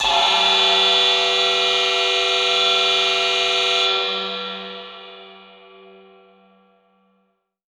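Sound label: Reverb Processed, alarm siren samples 125bpm; musicradar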